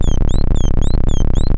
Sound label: tank eng; armora